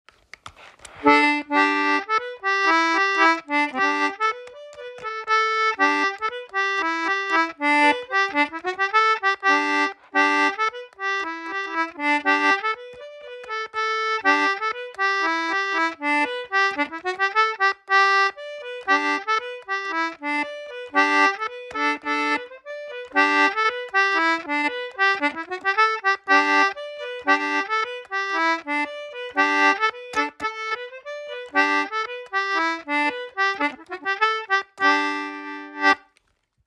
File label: Polka, metal reeds; Tripping to the well